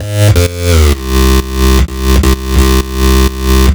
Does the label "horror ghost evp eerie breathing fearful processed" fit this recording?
No